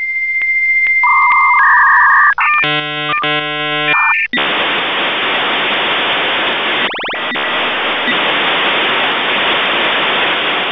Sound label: v34bis, modem